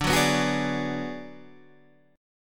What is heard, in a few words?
D 6th Flat 5th, Guitar DADGAD